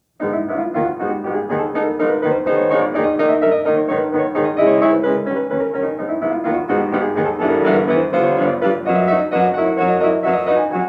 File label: Preludes; Chopin